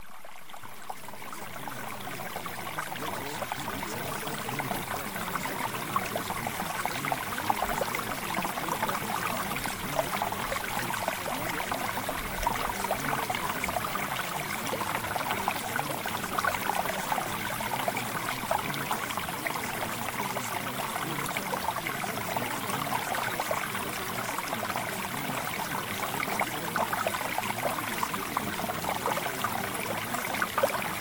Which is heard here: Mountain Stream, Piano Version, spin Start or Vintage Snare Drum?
Mountain Stream